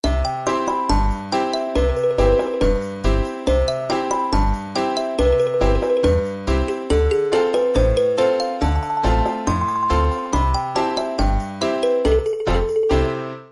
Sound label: bgm; smart-shoes-attack-chicken